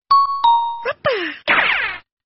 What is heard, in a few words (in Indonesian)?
nada dering WA pendek; Singkat tpi gemoy; TikTok